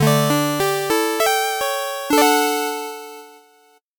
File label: 8bit jingle normal